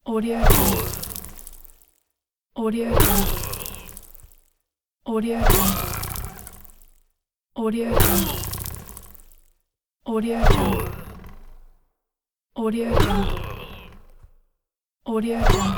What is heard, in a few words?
bows and arrows human impact; dastaudio